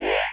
energy; BurutaruZ v2

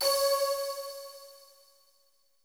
crystal

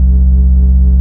magnet hum; burutaru

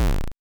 env hit; burutaru

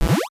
pickup fruit; PonPonChun

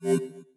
regular clear; ponponchun

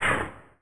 fire rocket; BurutaruZ

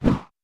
swap; ponponchun

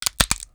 freeze; ponponchun

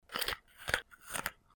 macintosh crunches; cgeffex; PonPonChun

